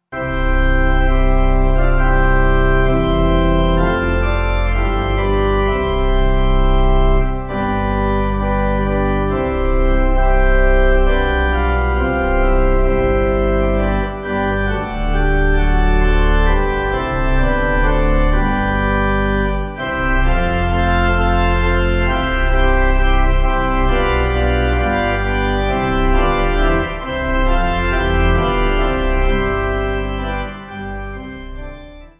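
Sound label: Pipe organ, church music backing; Praise; 8.8.6.D Hymn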